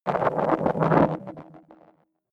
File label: pitch jump rumble short, sciene fiction